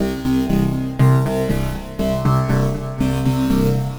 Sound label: Moding Synth, Synth Textures; Sonic Foundry (Sony Creative Software); The Electronic Music Manuscript Collection